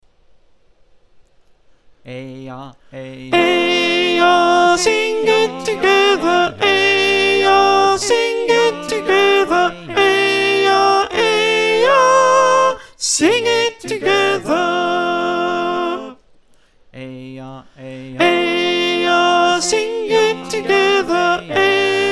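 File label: soprano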